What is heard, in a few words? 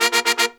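Pop & Funk Brass, 110 Bb, left; Best Service ProSamples vol.25; AKAI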